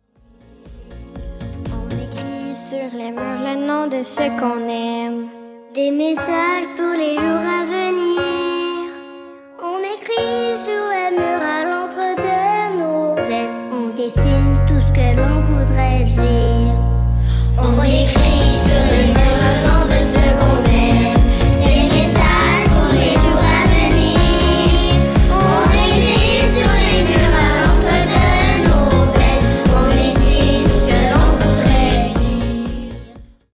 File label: Pop Star Birthday song